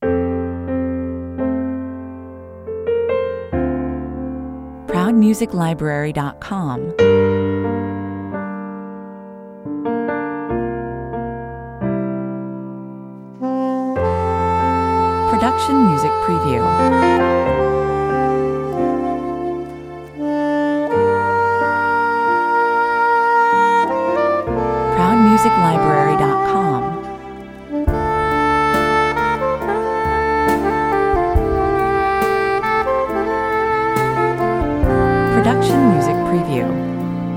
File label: sax love theme